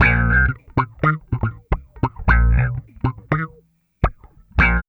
BASS, Funky Ass Loops; East West

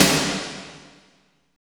SNR, Tekno Industrial; East West

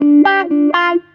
TAR GEL, Roots Disco; USB Soundscan vol.32; AKAI

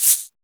YUCKY SHAKR; Drums In Your FACE!; FILM KIT2

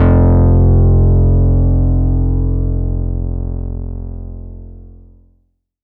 bass F1, Moog Minimoog

